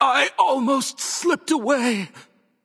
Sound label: death; cuchulainn